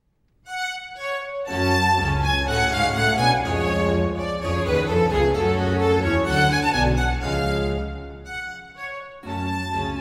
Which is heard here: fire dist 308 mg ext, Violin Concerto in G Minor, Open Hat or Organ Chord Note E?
Violin Concerto in G Minor